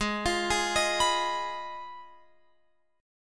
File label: Ab Minor 6th Ab, down, Guitar, Open F; Abm6; arpeggiated